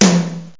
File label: tom1 (high fx); Octamed 6; walkabout; fx perc